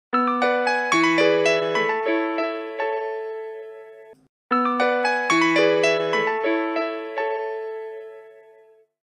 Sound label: nokia pianino realtone piano